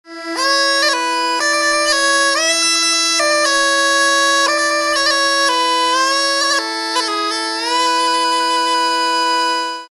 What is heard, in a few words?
glissando, sackpipa